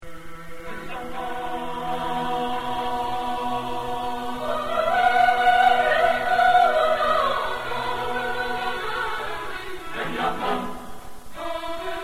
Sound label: Chamber Choir; Istanbul Chamber Choir